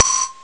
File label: xylo gate; Amiga Soundtracker sample